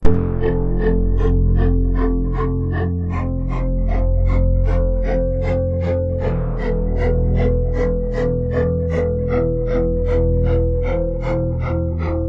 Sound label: Synth Poly; speed 0.56; tsmtoolbox; phasevocoder